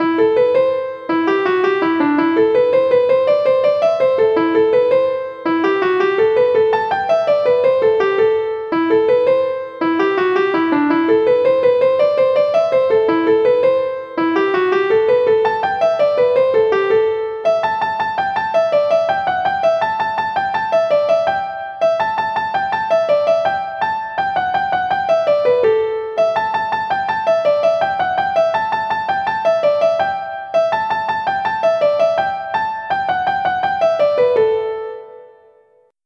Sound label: jig; boeuf trad